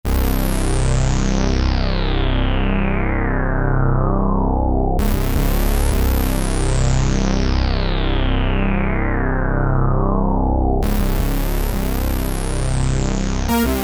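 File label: Bass Ana Sweep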